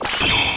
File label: concentration